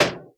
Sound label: shield impact